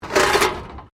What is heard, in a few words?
METAL BINLID SCRAPE WIRE FENCE; Metal (Sound Effects:Materials)